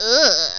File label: uhh